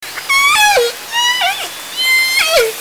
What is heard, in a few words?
call S1 three in row, Sea Sounds